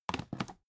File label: dvd case put down